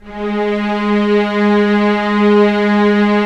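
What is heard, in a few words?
string section; Roland - LA Composer vol. 1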